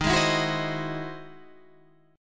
E Augmented Major 9th

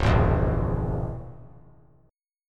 C sharp Minor 6th; C#m6; strummed; JGuitar

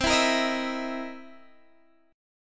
C 7th Sharp 9th C Guitar Standard; strummed; JGuitar

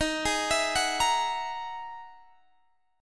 E-Major Sharp 11th D sharp

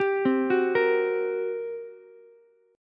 G Minor Major 7th Double Flat 5th, G, Ukulele; arpeggiated; JGuitar

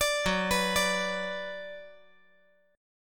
F sharp Suspended 4th Sharp 5th; arpeggiated; JGuitar

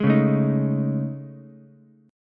A Major Sharp 11th, Ukulele, C6; AM#11; strummed; JGuitar

